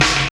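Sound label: SN, snare; X-Static Goldmine 1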